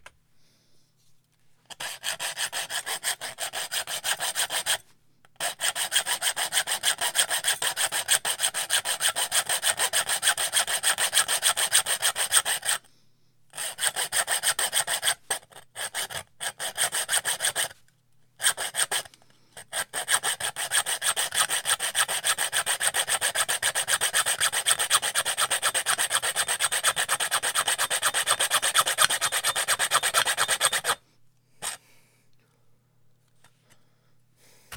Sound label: Metal Sawing